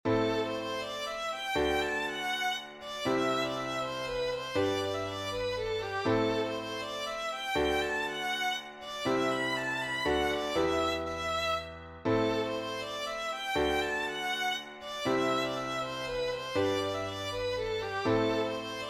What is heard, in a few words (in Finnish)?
metsäpolska